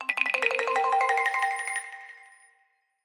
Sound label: Sms